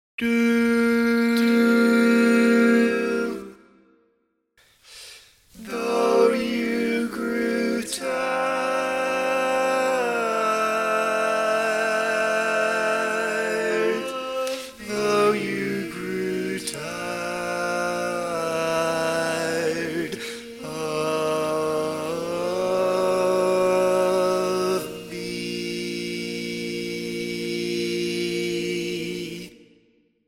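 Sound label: Baritone, barbershop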